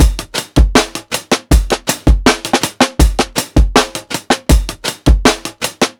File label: PICCOLO 80 16TH BEAT, DRUMS LOOPS; BASEMENT DRUMS VOL 2